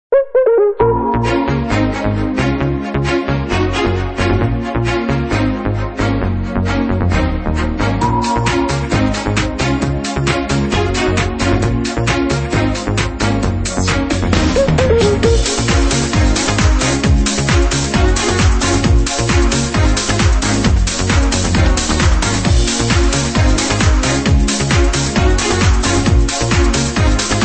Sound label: instrumental